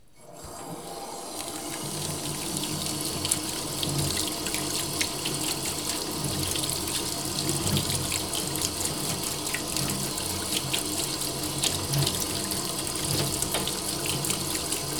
water, ambient sound in the house

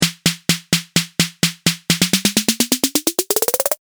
edm 808 fill

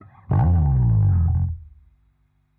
bass slide updown fast; manabu murai works

